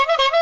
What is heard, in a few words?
clown horn short